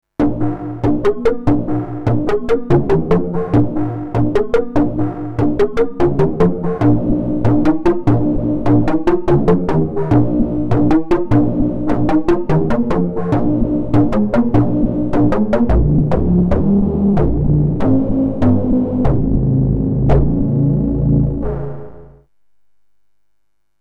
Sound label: Alesis A6 Doing Roland SH like sound